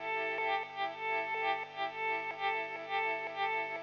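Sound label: electric guitar, ethno, 125, A; eJay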